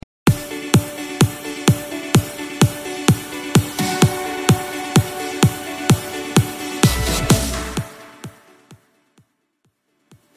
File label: EDM Vocal Mash Up; Mainstream VIP; Vegas Edit